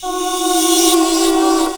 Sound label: Vox FX; Zero-G Jungle Warfare 1, 2 & 3; 90 s Sample CDs